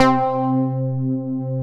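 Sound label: POLY PLUCKS, SYNTHS; Pro-Rec - Synthsonic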